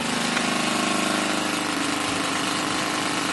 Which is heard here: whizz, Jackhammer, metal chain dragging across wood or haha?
Jackhammer